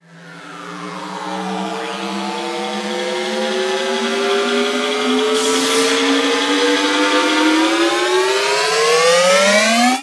Sweeps Long

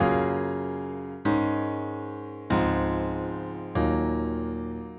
Piano 96 bpm

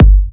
Bassdrum; Vengeance Samples

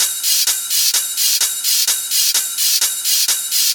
Minimal Loops

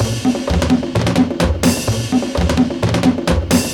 Latin House Loop 128 BPM; Vengeance Samples

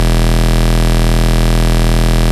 Root E, Bass

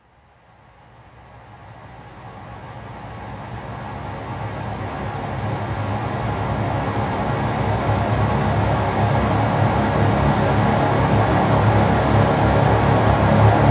140BPM Drone C#, Melody Fills; 8Bars; Vengeance Samples

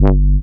Offbeat Bass G; Vengeance Samples

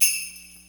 Sleigh Bell; Percs

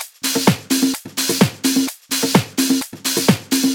Percussive Loops 128BPM; Vengeance Samples

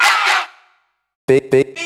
House Loops, Vocal Loops House 128BPM

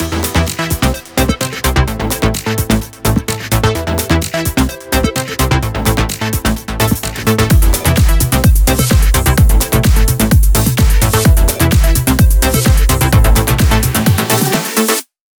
128BPM The Deep Mix; Vengeance Samples

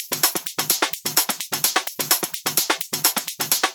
House Loop, 128 BPM; Vengeance Samples

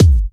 Bassdrums

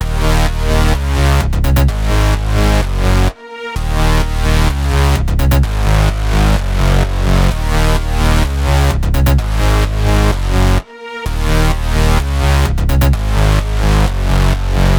128BPM Synths Root D#; Vengeance Samples; Close Kit

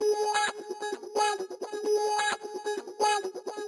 Special Sounds Root G# 130 BPM; Vengeance Samples; VEH3 Special Sounds

